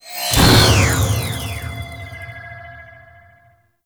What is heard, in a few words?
Energy Vampire; Warframe; Trinity